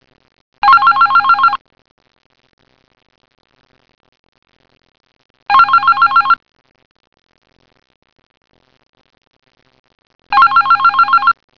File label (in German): TELEFON